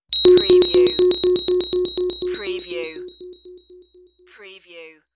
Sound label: Ringer